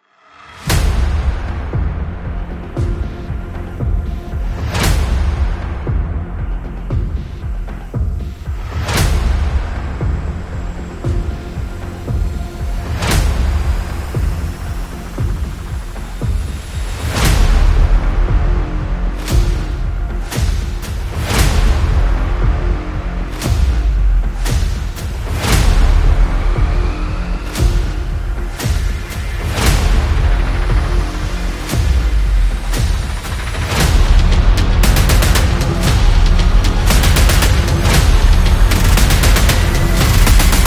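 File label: Action Trailer, battle background music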